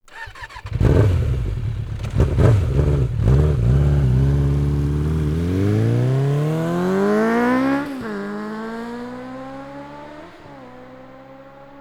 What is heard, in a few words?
motorcycle take off; transportation